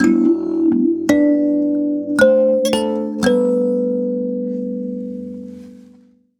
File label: Kalimba, African